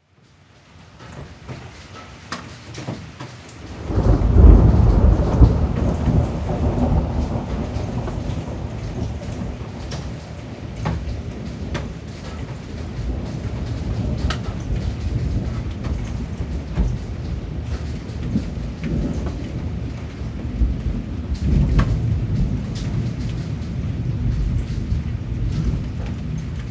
Thunder Real Ambi

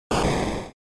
RPG Door; SNES

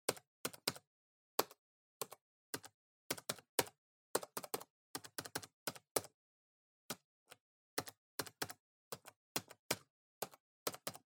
PC Keyboard Arrow

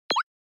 Button Click Low; Onoma

